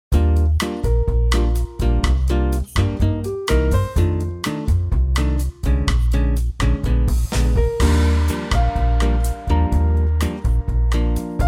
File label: Eb bossa; key - Eb - range - Gb to G